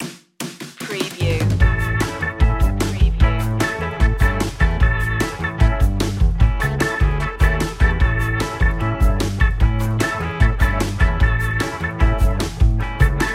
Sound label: funny fight, brisk